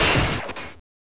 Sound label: crash, mad cars